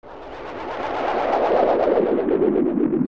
Bongo Bongo Appear; zelda; OOT

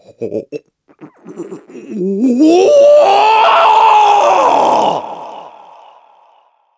Shocked; shocked by the entire Surface; Groose; Skyward Sword

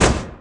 Grab; Zelda grabbing an opponent

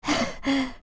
Young Huff; Young Link; Zelda; OOT

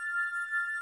Mirror Shield Reflect; Zelda; OOT

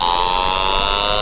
Bubble Form; A bonus bubble forming; zelda

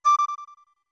Flute D short; D2; OOT

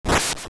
Boomerang; The Boomerang flying (repeats every 0.27 seconds)

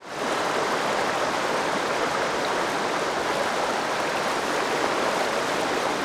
Water; Pirate Ship; The ocean water

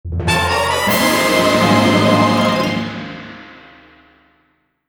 Fanfare Bow; "Get Bow of Light" fanfare

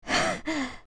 young huff; Link huffing when low on health

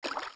Steps Shallow Water; Zelda; OOT

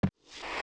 push crawl wood; zelda; OOT

